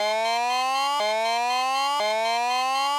Alarm Old; Pocket Planes; Android

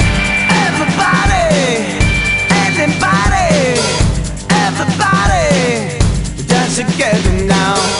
Blues Rock; Happy Feet Two; NDS

